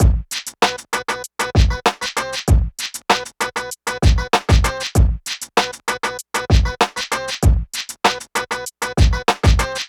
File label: HipHop Drums Synth Combo E min; Digidesign; Pro Tools Essential Loops